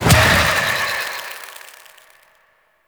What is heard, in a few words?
Fairy Dust Explosion